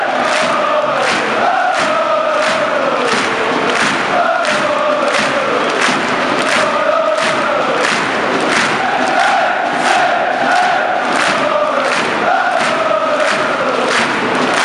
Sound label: lalalala